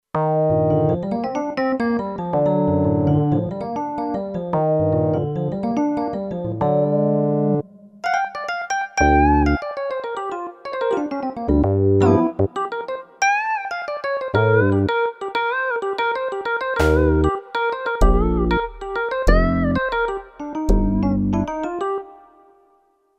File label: Fusioner, Kurzweil K2500X